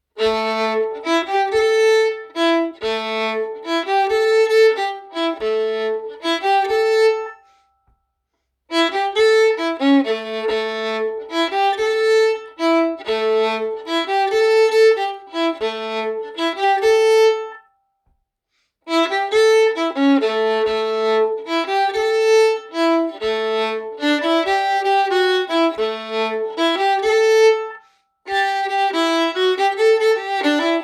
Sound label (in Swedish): Klappvalsen basgång